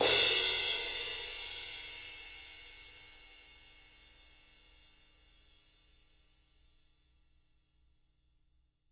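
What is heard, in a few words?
18 sizzle crash, jazz crashes, Living Drums; Sampleheads; Peter Erskine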